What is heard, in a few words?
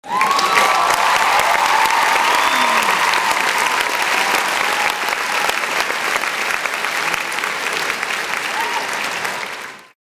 applause